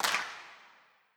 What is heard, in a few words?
Clap